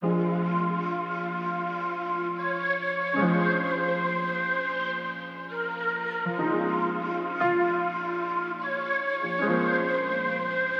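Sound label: A minor 77 bpm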